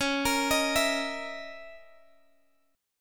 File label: C sharp Minor 6th Add 9th, Guitar Open G